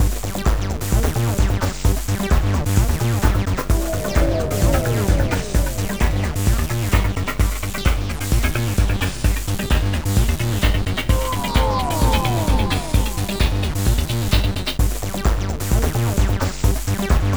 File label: BoomBox loop